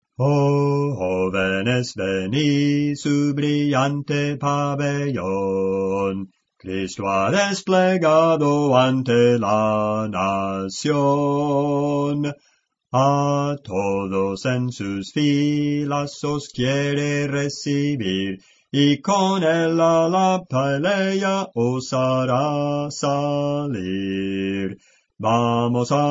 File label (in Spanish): Bajo, Cantos Espirituales; 020 ¡Oh Jóvenes Venid!; Clase del Canto